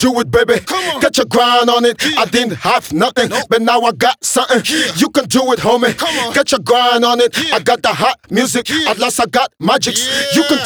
Rap Do It Double Hook, хип хоп вокала; сэмпл

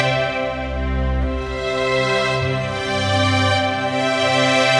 Orchestra, metal; Darkdesire; Метал

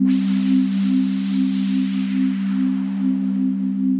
Falling, pad

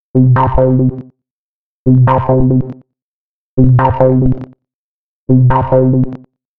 Cloned, dubstep

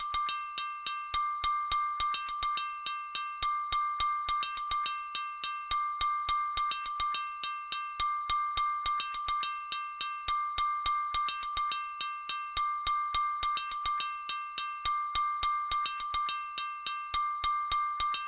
African Bell 105 BPM

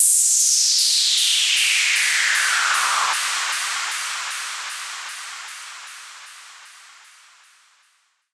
Noisy Fall Fx, electro-pop; звуковой эффект

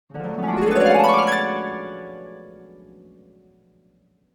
harp

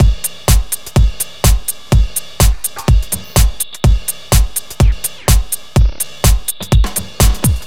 Beat; Gormok Beat

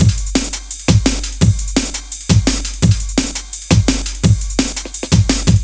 Liqueed Beat, 170 bpm

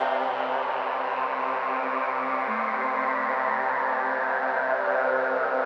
Bumtschak Pad; пэды

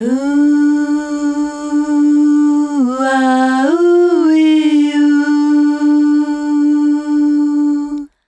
huuhh; 70’s Funk

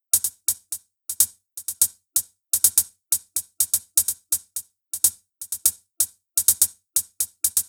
Zippel Beat L, dance

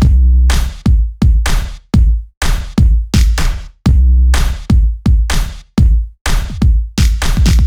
Stroke D, drum dance electro; drum сэмплы

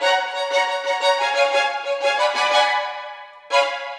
Spiccato Violin

